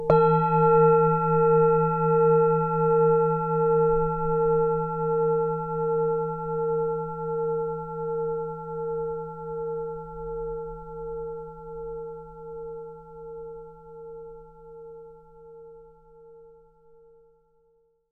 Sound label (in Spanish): Meditacion de 5 minutos para relajarse y desconectar con cuenco tibetano; Fullmoon